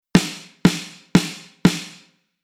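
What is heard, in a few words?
Rhythm Metal snare drum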